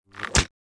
bow; poolofradiance

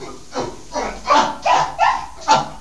Chimps; Alert Beeps; Cool Sounds-1

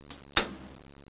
door open, fire; disaster

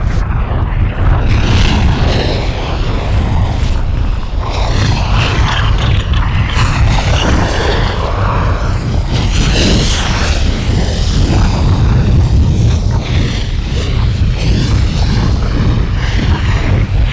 volcano

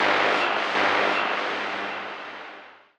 AMBNT 160; eJay; Ambient

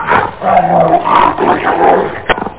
Monster Grunz; Instruments

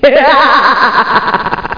EVIL HAA, VOICES; Klik & Play DEMO

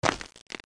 Step

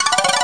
flipple

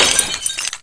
GLASS; Wanted

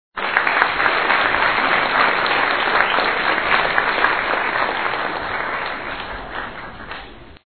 applause; Together in Jesus - First Reconciliation - Computer Fun

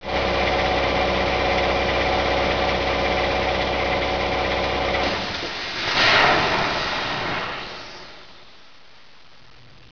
machine

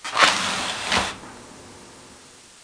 window

